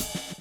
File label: groove; AMG Gota Yashiki Groove Activator